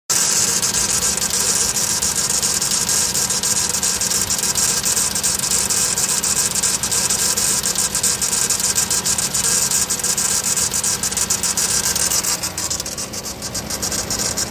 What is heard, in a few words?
ticking and squeaking noise; dodge caravan